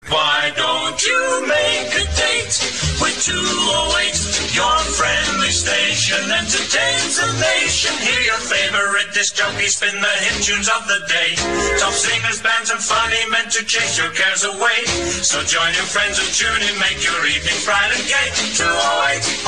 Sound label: jingle; R Luxembourg; 1950s